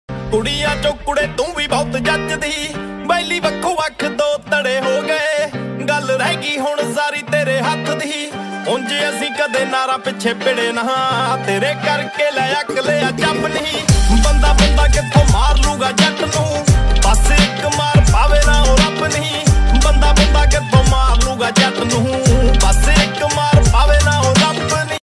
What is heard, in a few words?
Punjabi Ringtone